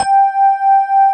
RHODES; Pro-Rec - Synthsonic

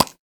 Can Cap, household rhythm samples, Hits

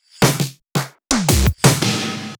fill, fills

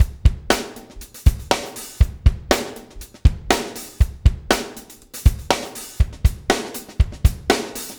DUGA, Backbeat

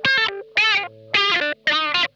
NOSE LICK, Funk Guitar; Zero G - Funk Guitar

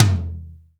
TOM, Drum Dry; East Collexion; Drum 1

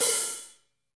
HH, Kits, Rev

